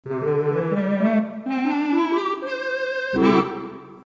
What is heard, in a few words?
8 Tibia 8 String 8 Brass Sax 2 2/3 Tibia, theatre organ